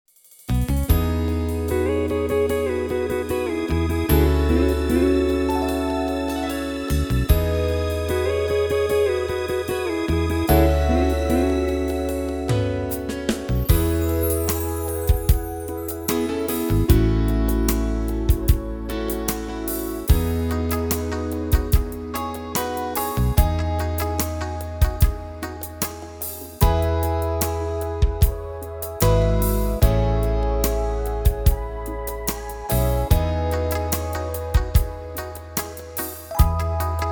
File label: E Backingtrack; genos houseband